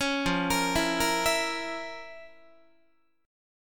F Major 7th Suspended 4th Sharp 5th F, Guitar; arpeggiated

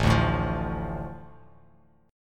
A Suspended 4th Sharp 5th, Bass Standard; Asus4#5 strummed; JGuitar

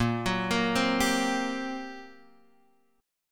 G Minor Major 7th Double Flat 5th, A sharp

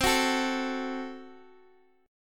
Gb Diminished

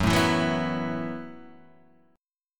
F sharp Minor 11th

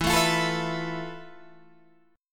F Major Sharp 11th E

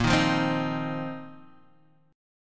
Bb Suspended 2nd Suspended 4th, Bbsus2sus4 chord; strummed

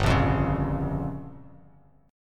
G Minor 7th Flat 5th, Bass Standard; Gm7b5 strummed; JGuitar

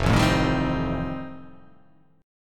F 9th F, chord

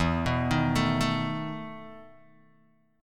E Major 7th Suspended 4th, Guitar, Open D; chord shape

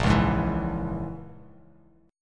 Bb Minor 7th Flat 5th, Bass Standard; 6,4,6,9; strummed; chord shape

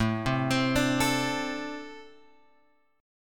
A-Minor Double Flat 5th A; Ambb5 Chord {5 3 X 5 3 5} arpeggiated

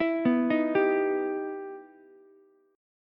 E Minor Major 7th, Ukulele; EmM7 arpeggiated; 9,11,11,10

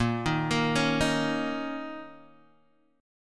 Bb Minor Double Flat 5th, Guitar, DADGAD; x,1,1,3,4,1

